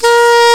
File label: SAXY; fairlight cmi